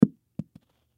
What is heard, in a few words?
Object Drop, Object Drop On Carpet; Drops; Foley; Sound Effects:Objects; SoundEffects-Blastwave